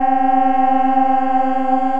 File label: Wailing Wall, Ethereal Pads; Ableton; Mood Reel